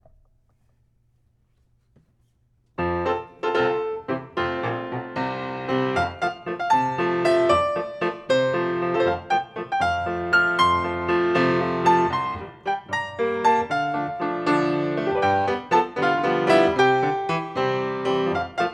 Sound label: fast waltz; Gianni Schicchi